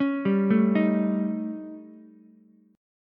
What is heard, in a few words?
D sharp 7th Flat 5th, D sharp, down, Ukulele; arpeggiated; JGuitar